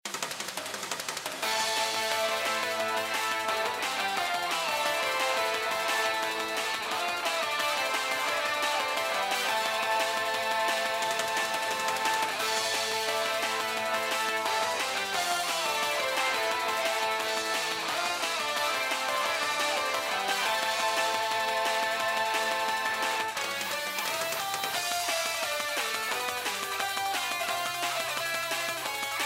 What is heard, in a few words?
classroom game filtered; Poptropica; Mega Fighting Bots